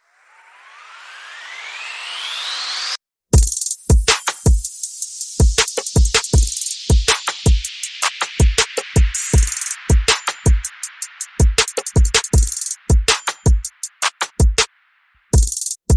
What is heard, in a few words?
Perc and FX